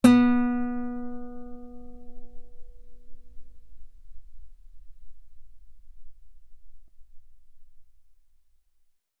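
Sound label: Guitar ff B string B3; sul B; OmniPower4296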